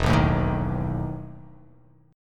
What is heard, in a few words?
E Major 7th Suspended 4th